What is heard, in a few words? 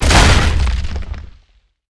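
railcar impact; hl2 ep2; ambient, levels, outland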